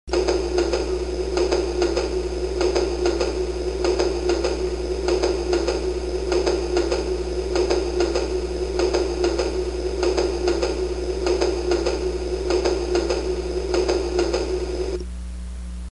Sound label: click clack